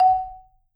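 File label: Marimba medium F F#4, marimba; Sampleheads - Dave Samuels Marimba & Vibes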